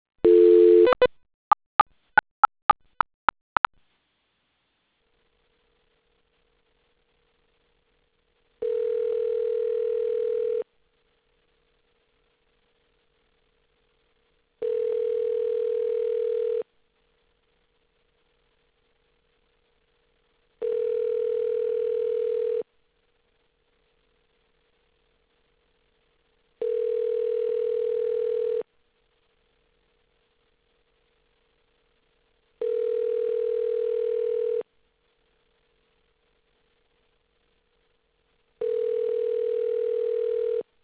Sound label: ringback crosstalk